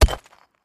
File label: Concrete Chunk Drop; rocks & dirt